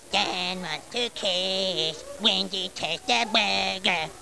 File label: kiss